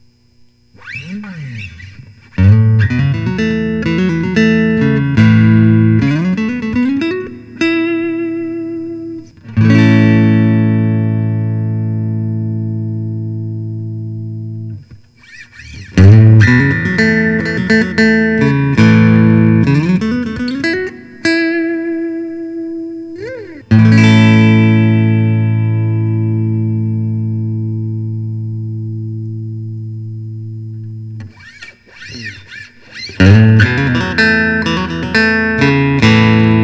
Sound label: fender telecaster natural refin